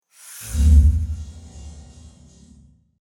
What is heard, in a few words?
menu close